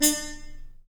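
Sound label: brass trumpet mute stab, Harmon stabs; Harmon Amb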